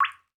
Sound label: Liquid Water Drop Single